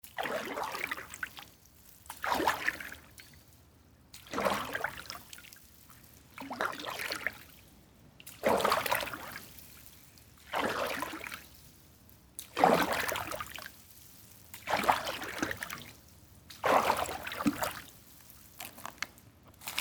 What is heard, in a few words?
water being stirred